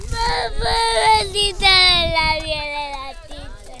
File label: Kid Crying